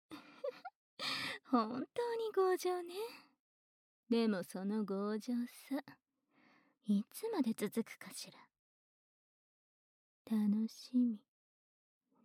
意地悪耳舐め挑発, ASMR; 明澄の意地悪耳舐め挑発

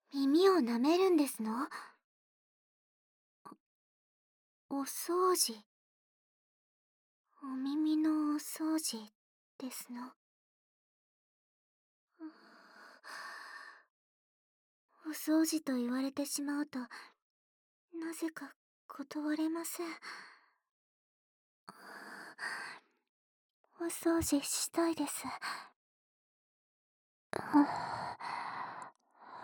耳舐めボイスコレクション, 环绕音 舔耳; 西園寺レイカ編; SweetNightmare

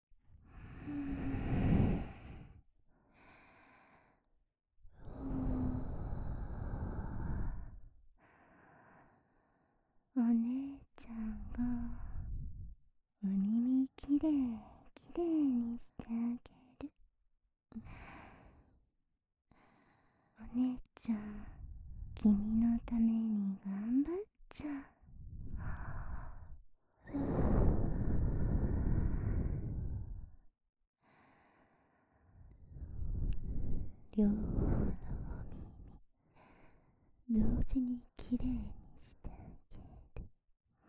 お耳ふーふー安眠両耳かき, ASMR; 超密着0距離音声

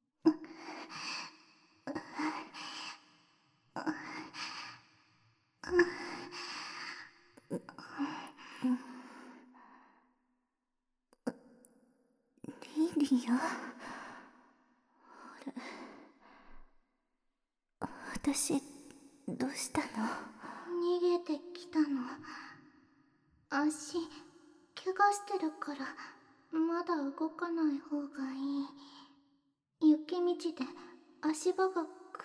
氷壁の洞窟と耳舐め（BGMなし）, ASMR; 北の大地と放浪姉妹

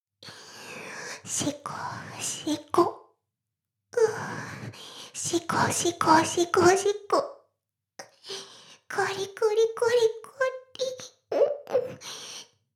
しこしこ; 全妹計画２; 【メスガキ妹】わからせてくれるのよねぇ～?おにぃ～・ちゃん♪【全妹計画<オール・シスターズ> 2妹め】

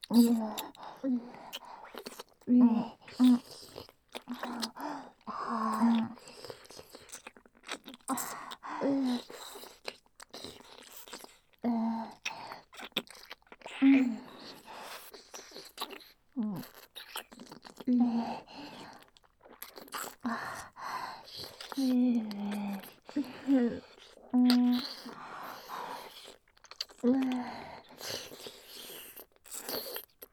三人でキス; 生意気ナツキはナツキに勝ちたい! ～夢の中でも勝手にイっちゃう弱くて強気なボーイッシュ×2～

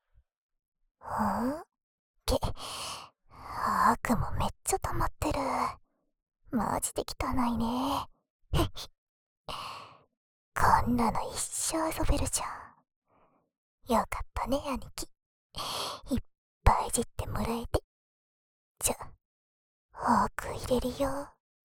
【左】奥耳かき, ASMR; 小分け反転ver; 妹の友達のギャルメスガキのあーししか勝たん耳ほじりで俺は敗ける。

